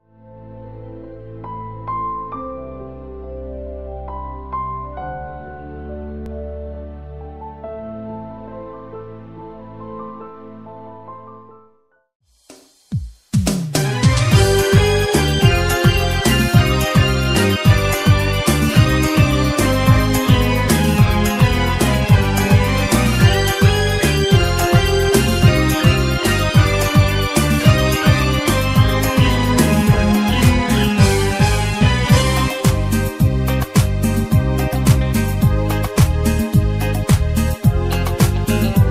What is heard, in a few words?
karaoke